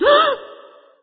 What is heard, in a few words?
female gasp inside